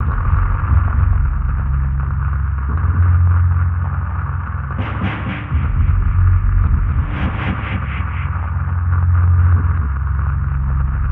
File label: Sinister C#2; Zero-G - Ghost In The Machine